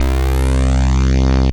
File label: Dual Sticky Bass, Synth Bass; Ableton; Factory Packs; Beat Tools